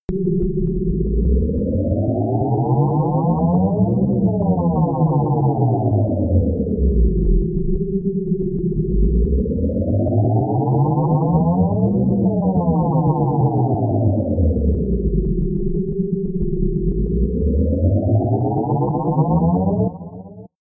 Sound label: emo ghost